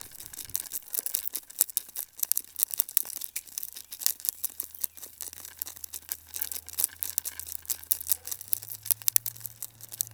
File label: carpenter bee inside wood